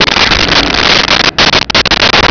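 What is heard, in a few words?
voice wampa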